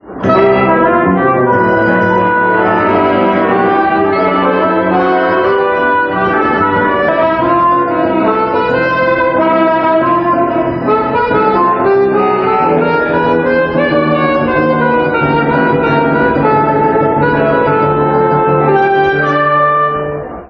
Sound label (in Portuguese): Piano do Fundo